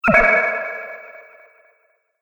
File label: Strange Plop Hit Echoes, Impact Sound Effects; Miscellaneous Impact Sound Effects